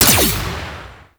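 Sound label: Igniter Pistol Projectile Explosion; Warframe; Weapons; Corpus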